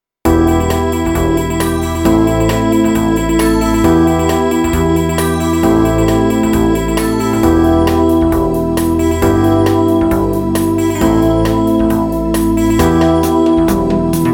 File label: Rock Rhythm in Em Synth Band 134